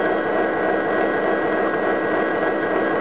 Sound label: engine